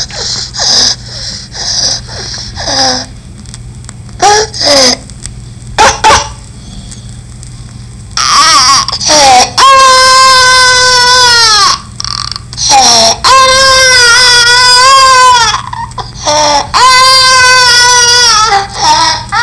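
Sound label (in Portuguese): estridor, sons e choros pediátricos; ESTRIDOR LARÍNGEO; PEDIATRIA UFF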